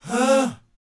BOYZ A# C, Vocal Planet R&B Dance; Spectrasonics Vocal Planet

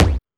Techno F; EMU XL-7

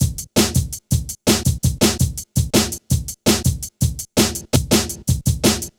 Hard Stepz, Jungle, Drum Loops; 165.5; Zero-G Jungle Warfare 1, 2 & 3; 90 s Sample CDs

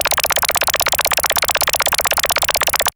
ALARM

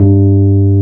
AMB ROAD

